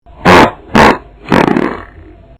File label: fart